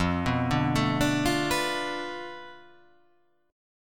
F 6th Flat 5th F down, chord

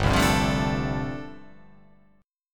G 9th Suspended 4th

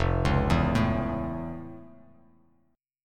F Augmented Major 7th F; F+M7 arpeggiated; 1,4,2,2; JGuitar

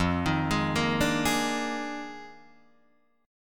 F Minor 13th, Guitar Drop D

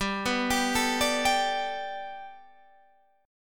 G Major Add 9th, Guitar, Drop D; 17,14,17,14,15,15; down

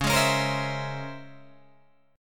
Db Minor Major 13th Db Guitar DADGAD; strummed

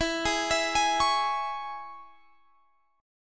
C sharp Minor E, chord

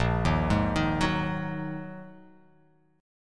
A sharp 7th Suspended 4th Sharp 5th, chord

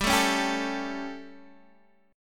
G Suspended 2nd Flat 5th G, Guitar, Open D

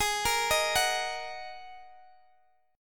G sharp 7th Suspended 2nd, Guitar, Open D; down; chord shape

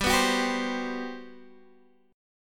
G Major 11th, chord; strummed; JGuitar

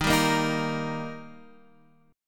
D sharp Major D sharp, chord; strummed; 11,10,8,8,11,x; JGuitar

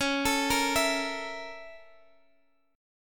A sharp Minor Major 7th Flat 5th C sharp

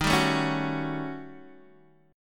Eb 7th Sharp 9th Flat 5th, Guitar Open D; x,6,5,3,4,4; down

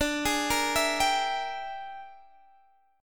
E 7th Sharp 9th Flat 5th D, chord

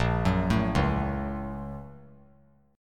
Bb Minor Major 7th Sharp 5th; BbmM7#5; arpeggiated; JGuitar

